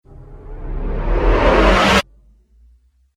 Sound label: Riser Abrupt Stop Chorus; Rise And Dive